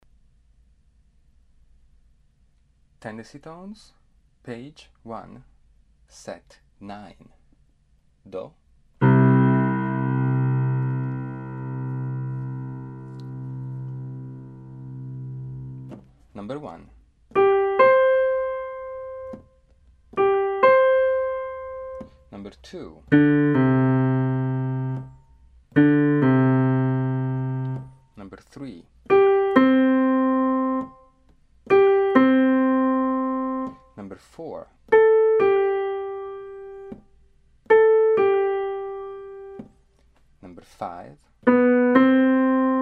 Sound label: tendency tones